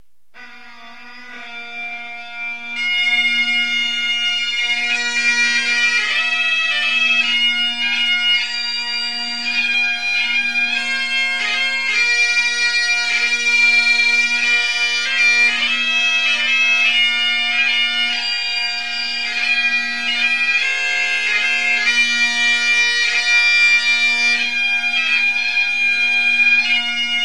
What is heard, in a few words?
Skye Boat Song, bagpipes